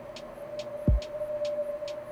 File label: Windy; BB 2HTC Samples Vol 4; Snow Day